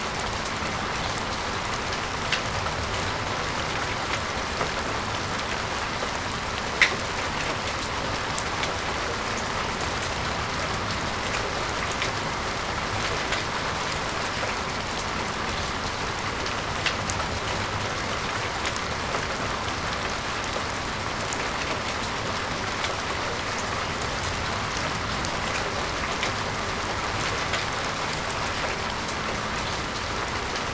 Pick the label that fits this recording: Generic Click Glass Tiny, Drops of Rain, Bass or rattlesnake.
Drops of Rain